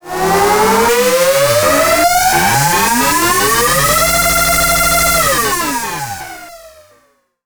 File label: Charge Laser; how to make loop sound